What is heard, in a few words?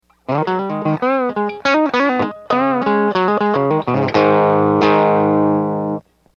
rock